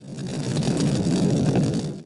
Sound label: fire; BattleBattle